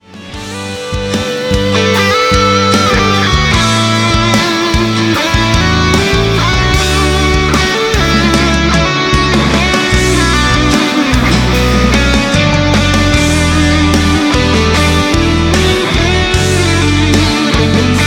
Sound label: Solo